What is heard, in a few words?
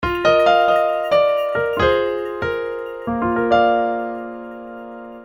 Aesthetic, notifications; Oppo; T703